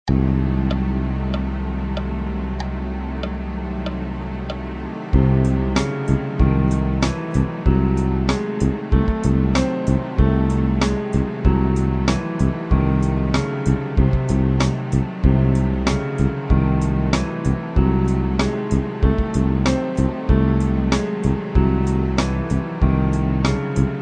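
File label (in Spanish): armónica disminuida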